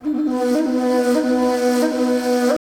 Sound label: SIERRA FLU